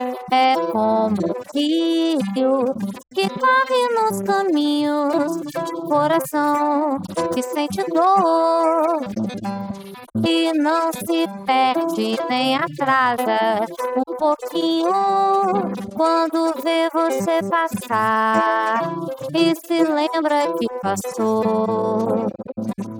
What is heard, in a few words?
vocals; SiSEC11